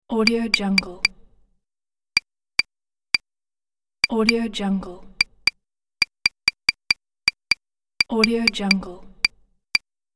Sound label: text message typing ui handheld phone device